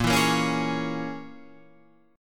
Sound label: A sharp Minor 7th Flat 5th; A#m7b5; strummed; 6,7,8,6,9,9